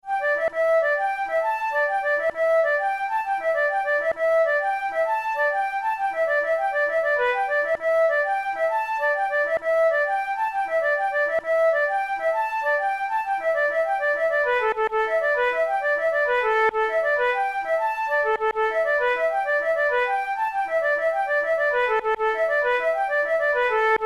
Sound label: jig, flute tunes